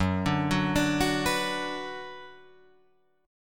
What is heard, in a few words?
Gb Suspended 4th Sharp 5th, Guitar, Drop D; down; chord shape